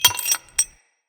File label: Smithy Metal Misc; Ambience; Soul Plane